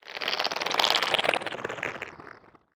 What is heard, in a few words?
Creak; moul-assets